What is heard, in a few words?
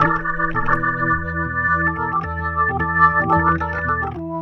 Organ